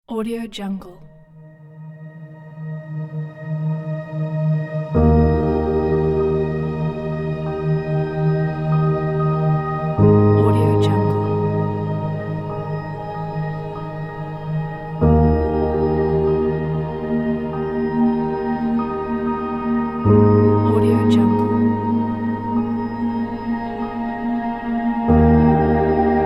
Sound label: soft piano background